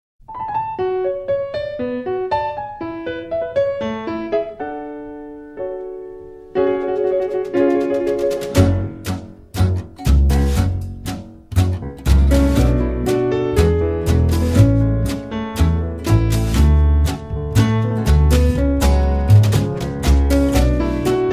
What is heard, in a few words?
tango, melodica